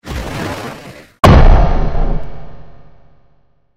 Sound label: ship player shoot; kde; kbattleship